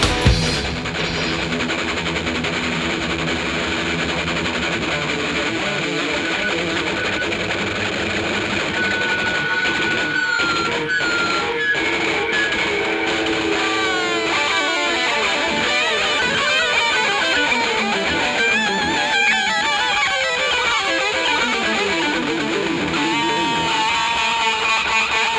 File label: guitar solo; london england september 1973